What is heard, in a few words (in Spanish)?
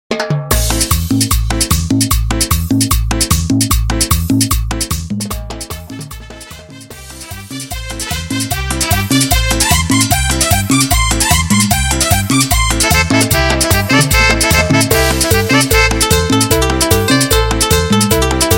Pianos